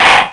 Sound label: slam down; godot